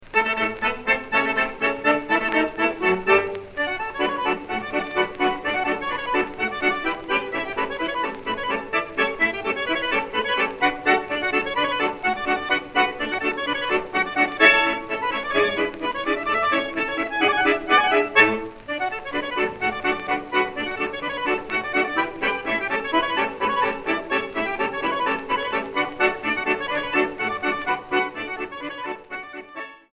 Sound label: polka